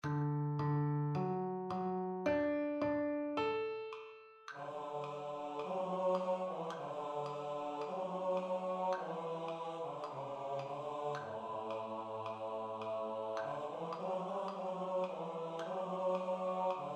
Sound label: What Are We Doing BASS STUDY